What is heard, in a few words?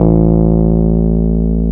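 Piano Rhodes; Club-50 - Foundations Roland; FRhodes2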